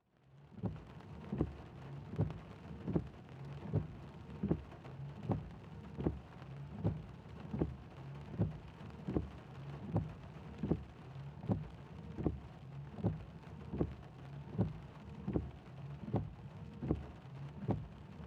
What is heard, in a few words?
Ambience Traffic In Rain From Car Interior Wipers On; AdobeCC